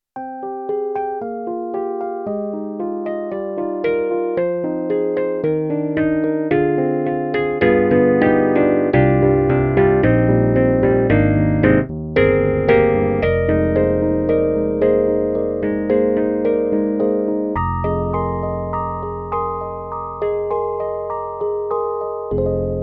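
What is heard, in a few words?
EDIROL SD-80, Electric Piano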